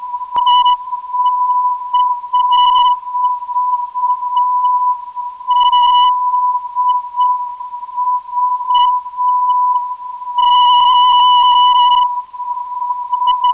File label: 420kHz, ndb